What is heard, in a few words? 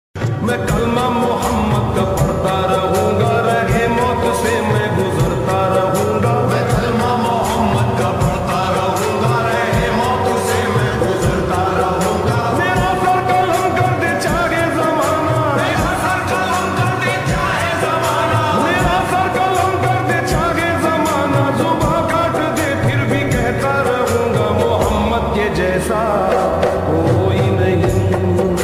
islamic ringtone; ramzan ka 2nd jumma mubarak